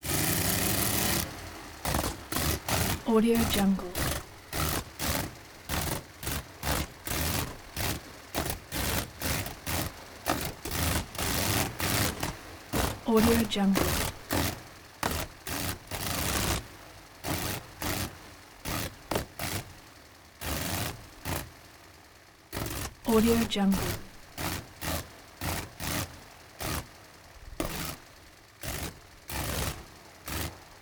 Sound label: convair b36 peacemaker sputter